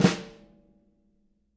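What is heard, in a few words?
jazz snares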